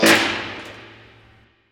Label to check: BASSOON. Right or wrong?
Wrong